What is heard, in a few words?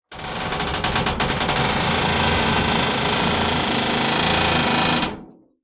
door creak med, squeaks